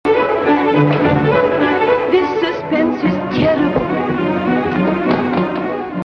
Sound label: suspense; being ernest